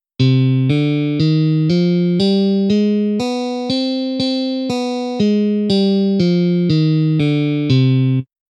stupnice elektricka gitara harmonicka mol

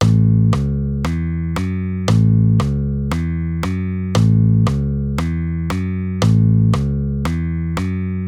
Figura tony A1 Cis E Fis

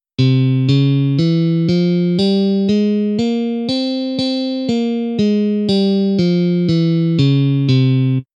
stupnice elektricka gitara ciganska dur

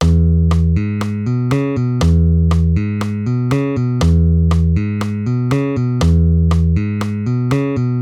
basa beat figúra

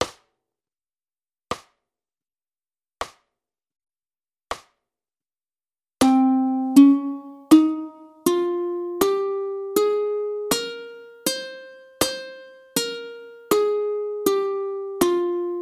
ukulele stupnice frygicka

